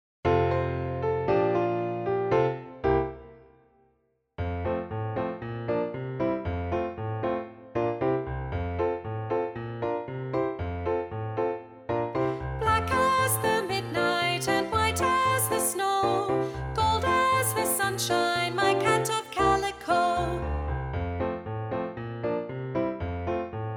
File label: Soprano Teaching